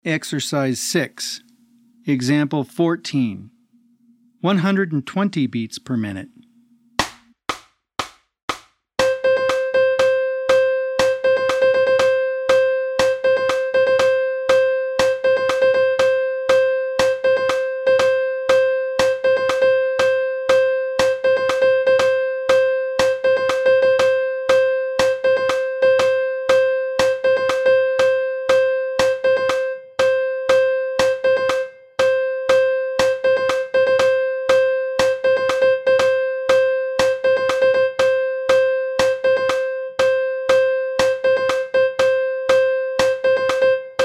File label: 120 BPM